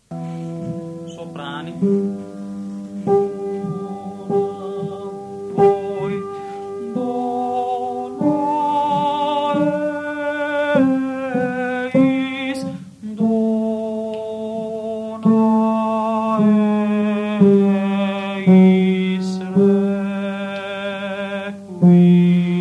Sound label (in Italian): Requiem Agnus Dei tenori; Mozart